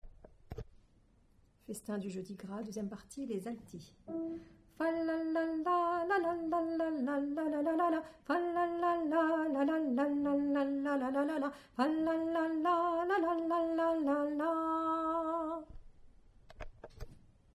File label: Alto